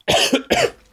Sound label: coughing